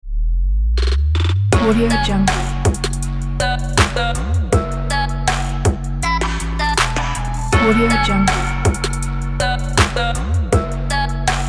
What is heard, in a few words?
drive action trap ident